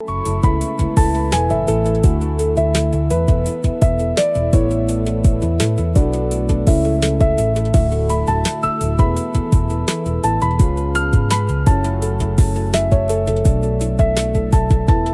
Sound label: loop; bgm; education